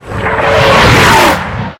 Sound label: damage hits explosions, Warships